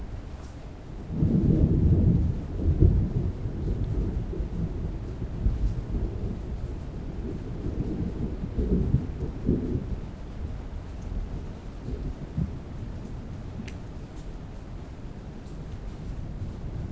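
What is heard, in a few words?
ambience thunder loop; midnight guns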